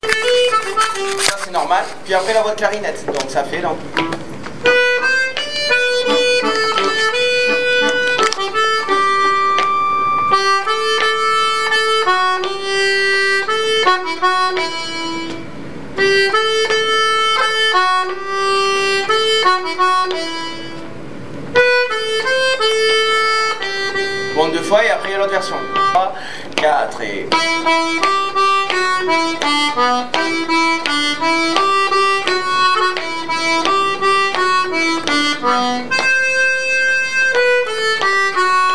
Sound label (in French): voix 2 pour violons; Ederlezi